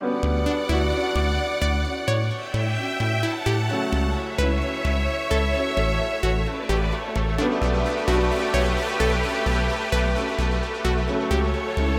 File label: ELECTRO ROCK SYNTH